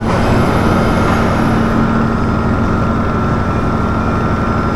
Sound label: door move; Dystopia; doors